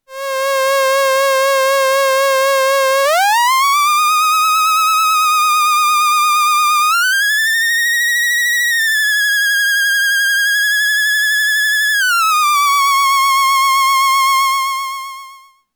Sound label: Moog Mother 32 Theremin